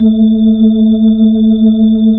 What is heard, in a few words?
B-3 Chorus